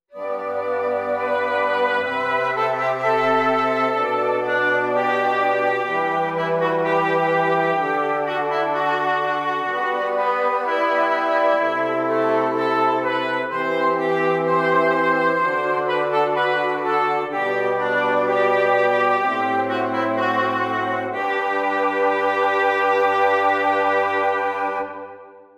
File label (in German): Stimmiger Contrapunkt; 5 Gattung; Ronge